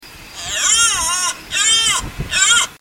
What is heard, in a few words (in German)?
Hirsch vogel roehrt